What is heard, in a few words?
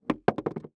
Sports Board Skateboard Rattle